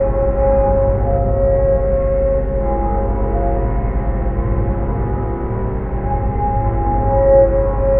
Drone Ambient; Drums & Drones

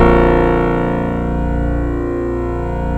A#, PIANO; Big Fish Audio; Synclav FM

